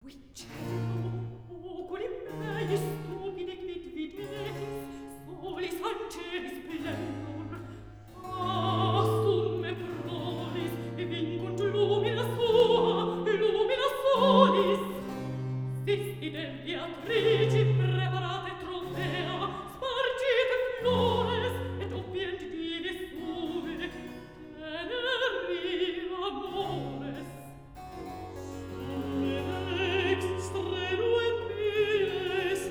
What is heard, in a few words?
Recitativo; Juditha Triumphans Oratorio Part 1; Holofernes, Juditha; Quid Cerno!